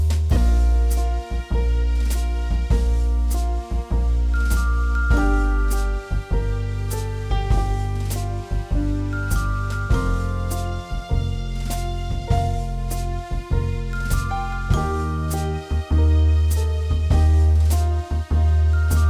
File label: instrumental; pakiet gwiazdki